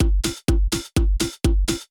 drum loop house 125; eJay

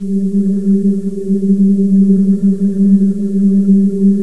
vox loop; thief

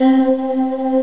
Lead 6 (C3); Return To Zork

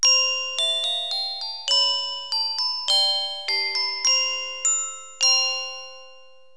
glock; Roland; An Invitation To The Roland World Of Music

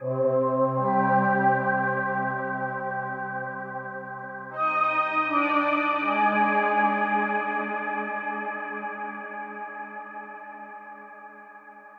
synth pad ambient 160 C; Sphere

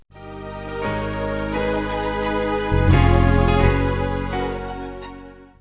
transition

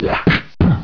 BEAT UP; PC World Komputer 1996 February; virus

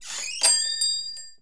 channel join; VypressChat

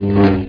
lt saber swing; MOTS

